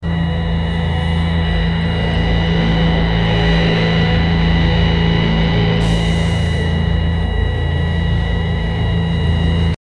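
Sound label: medieval fantasy town noise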